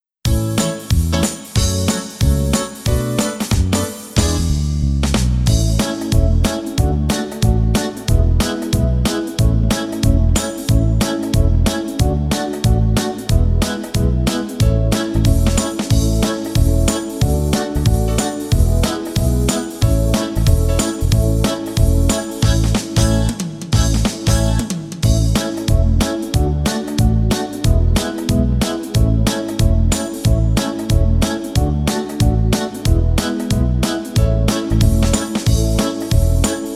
how much karaoke

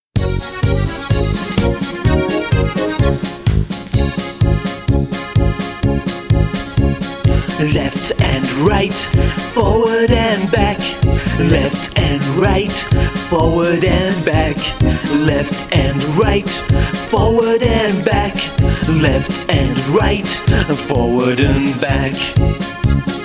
left and right song

